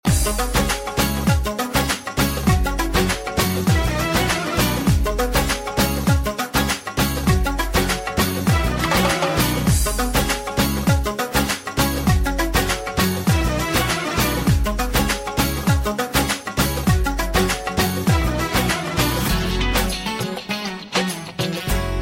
Guitare; Guitare Hero

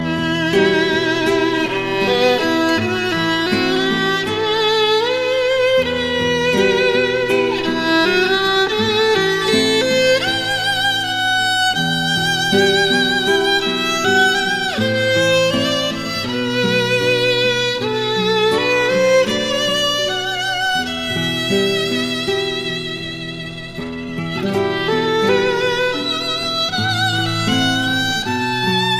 Violon

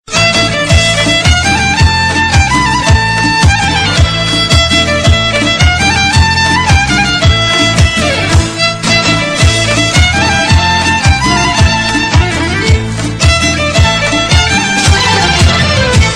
Violon; Emperor Ali

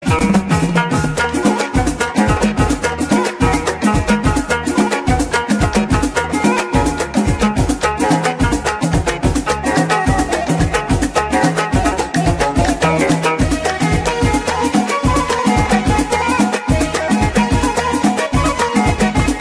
Marocain

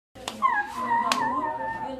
T-rex gracieux

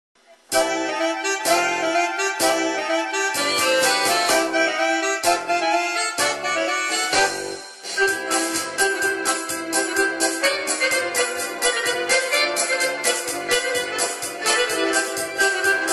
Orgue; Expos MTL

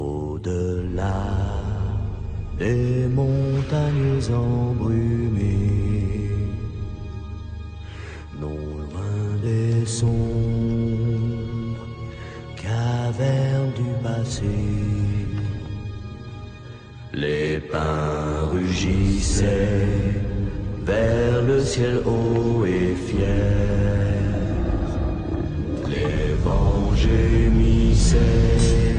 Chant Des Nains

